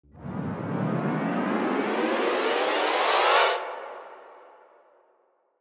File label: Scary Strings Crescendo Fast